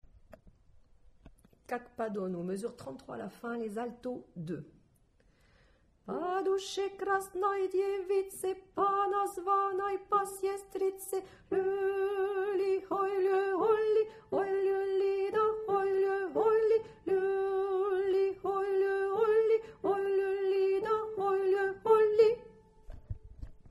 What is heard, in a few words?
Alto